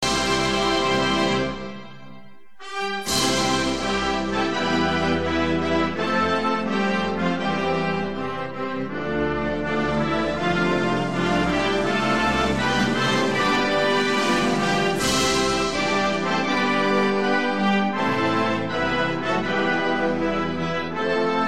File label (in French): MUSIQUE HYMNE NATIONAL RUSSE; chants et chansons